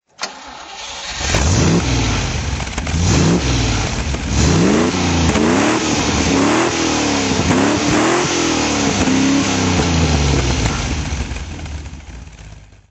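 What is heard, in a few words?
mgb gt v8 throttle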